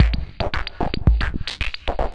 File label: philter (dark orb); Sonic Foundry (Sony Creative Software); Ugly Remnants VOL-2